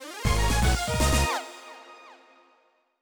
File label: Fanfare; Ring Fit Adventure; JGL GymResult